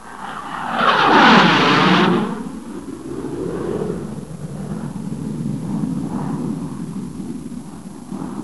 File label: JET